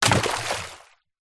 foley wood stick large through into puddle water splash